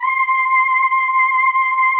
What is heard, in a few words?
PAD, DIGI-PADS